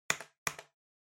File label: Trackpad Click Far L Hard Double